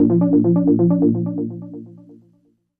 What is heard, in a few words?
Bouncy Round Tones Transition